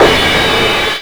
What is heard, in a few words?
crash, trash kit, Drums Dance & Groove; USB Soundscan vol.11; AKAI